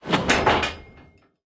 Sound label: gears rattle